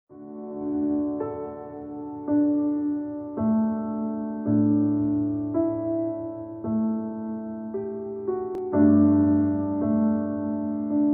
Piano Relaxation

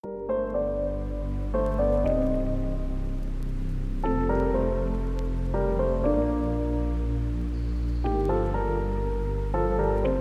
instrumental lofi

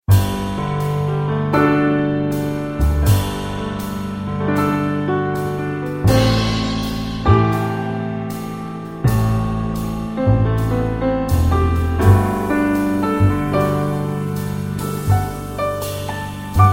Jazz Piano Trio

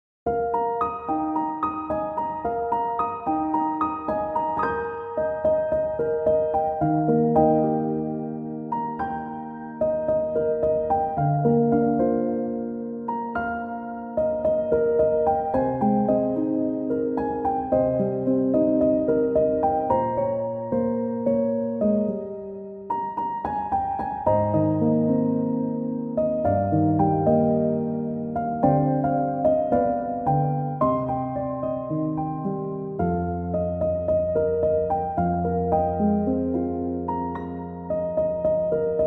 chill piano instrumental; from “Frozen”

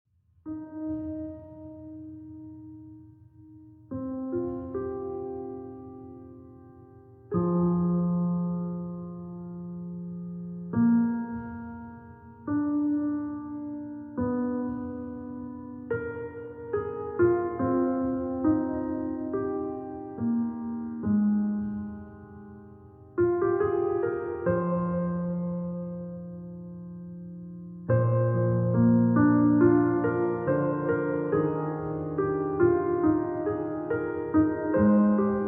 Piano Relaxation

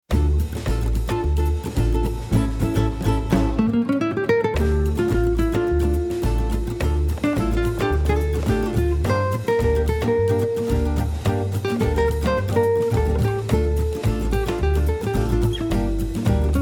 Bossa Nova Nouveau - Samba

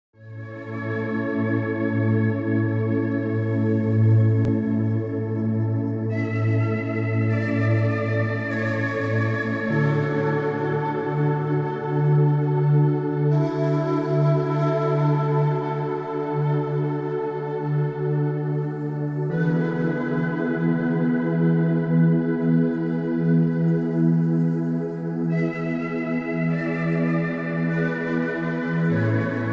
Ocean Harmony

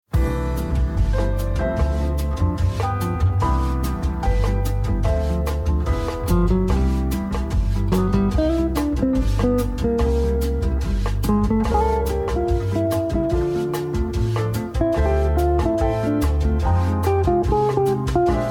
Bossa Nova

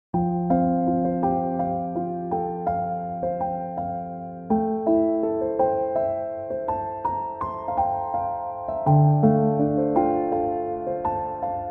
piano instrumental

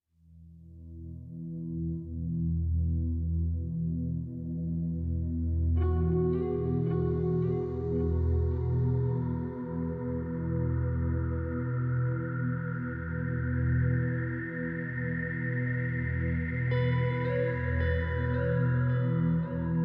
Relaxing Spa

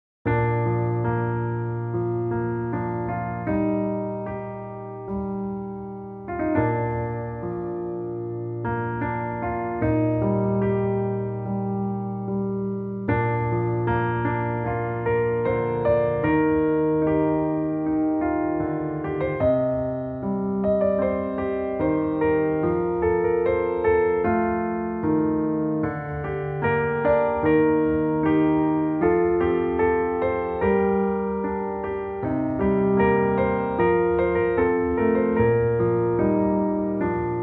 Ambient Stream; Guitarra-Cuerda-Piano-Ambiente

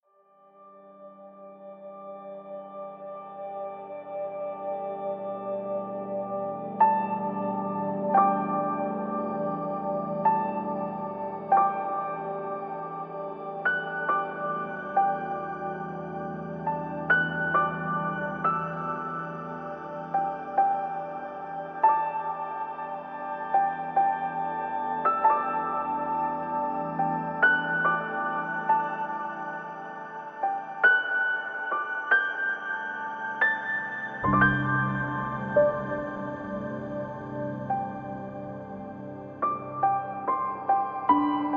Soundscape Piano; hotusa spa

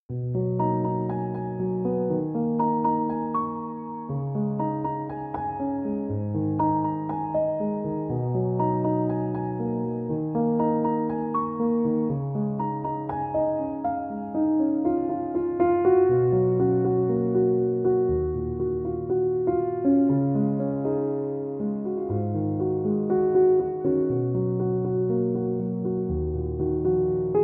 Piano Instrumental

